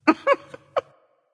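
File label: heh heh, female; smite; voicepack; hera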